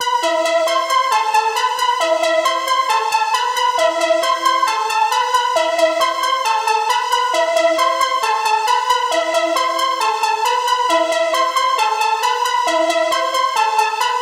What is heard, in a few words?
135 Trap Melody